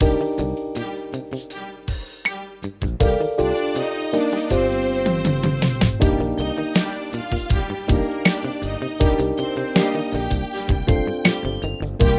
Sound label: hiphop